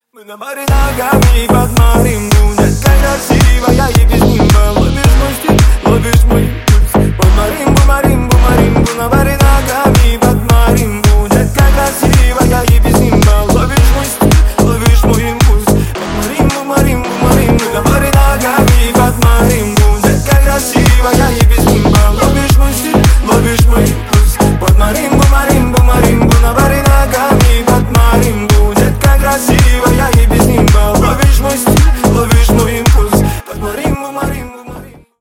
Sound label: marimba remix